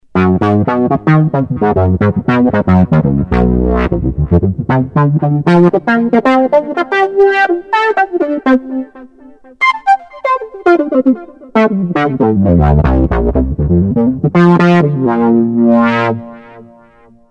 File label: Fuse Lead